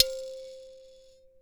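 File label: C4 mbira left; WorldSounds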